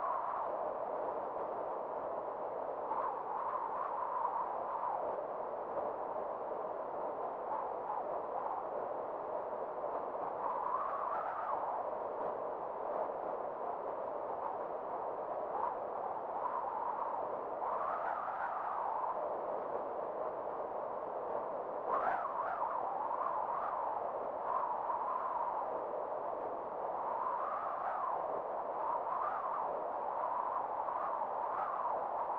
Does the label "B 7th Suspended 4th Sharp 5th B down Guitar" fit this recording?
No